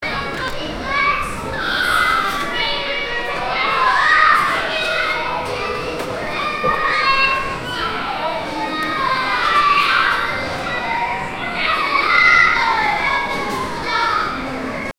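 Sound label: the atmosphere of children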